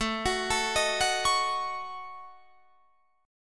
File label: A 6th Flat 5th, Guitar, Drop D